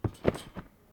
trampoline realistic

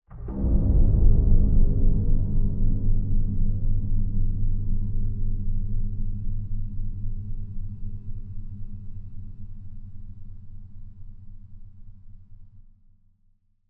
atmospheric element tension; sonniss gdc

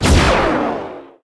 Thermal Rifle Fire; lancer weapon fx

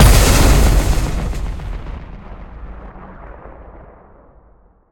impact; bluezone; fvtt sonniss gdc audio

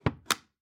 closing latches; fvtt sonniss gdc audio

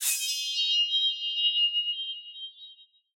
sword ring scrape gleam; sonniss gdc

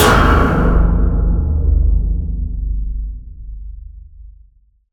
cinematic metal impact; bluezone; sonniss gdc

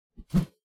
melee attack swoosh heavy, weapon swooshes; psfx

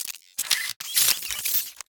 robotic lifeforms servos variable nano machine; sonniss gdc

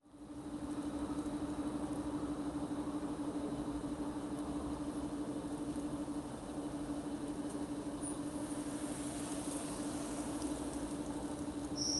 outdoor gas station car park; Sonniss GDC

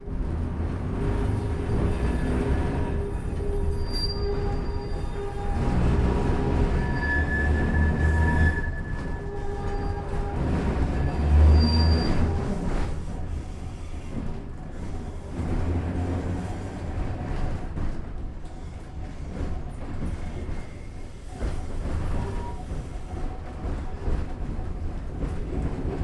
tram interior ride

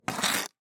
ice hockey stick short scrape; sonniss gdc

